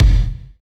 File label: END, Tekno Industrial; East West